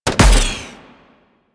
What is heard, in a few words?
Shot, Weapons; Warframe; Tenno; Miter